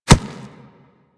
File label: Step Dirt, Footsteps; Warframe; Kubrow